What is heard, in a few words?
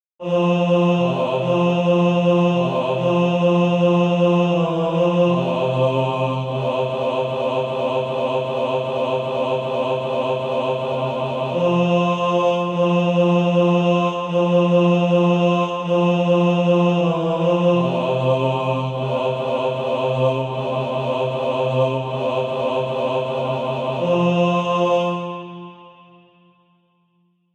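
bass, Practise Music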